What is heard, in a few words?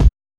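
BASS DRUM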